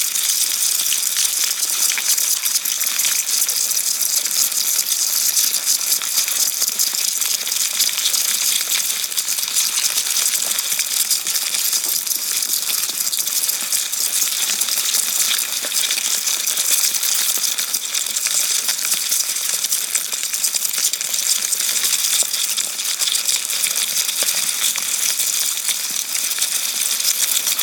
dubia roaches, close range recording of Dubia Roaches; field recording; soundscapes